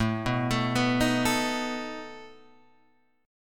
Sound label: A Suspended 2nd Flat 5th A down Guitar Open G